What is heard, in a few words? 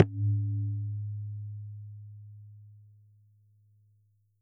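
lampshade, coco lamp; Q Up Arts; Denny Jaeger Private Collection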